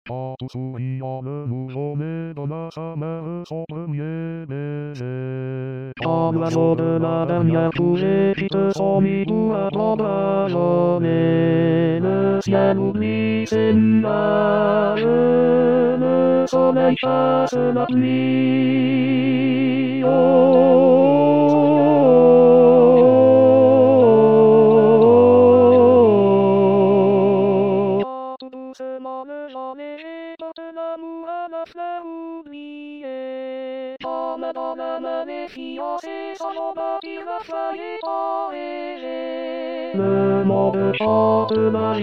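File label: tenor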